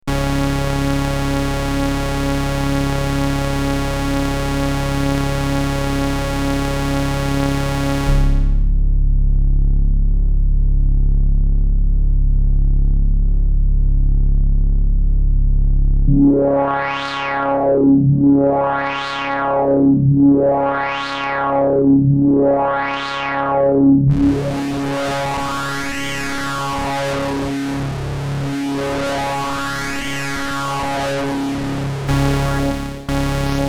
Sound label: MS-20 Synth; ARTURIA FILTER